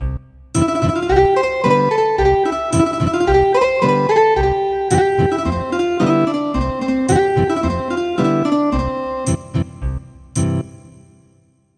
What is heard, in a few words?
Ethnic Guitar, midi traditional